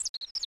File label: Birds